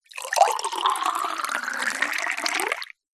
food drink wine or water pour into wine glass flute